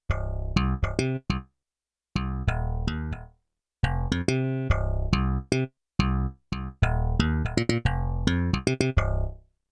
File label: Slapbass C1-C3, Yamaha TX16W; Combo Performance 1